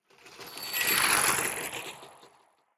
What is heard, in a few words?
bicycle dirt; Blind Drive